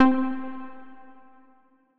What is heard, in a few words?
PLUCK (C), Melodic One Shots; Fly